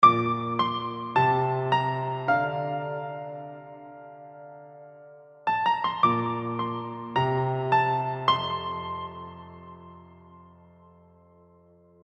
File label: Chorused Piano